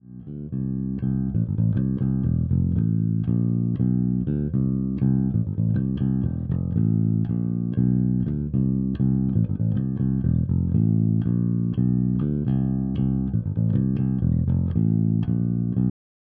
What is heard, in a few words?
AG750; Zoom B2 Four